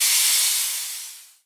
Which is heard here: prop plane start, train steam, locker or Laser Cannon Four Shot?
train steam